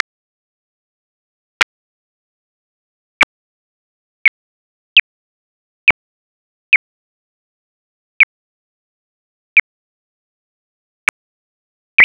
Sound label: mastiff bat search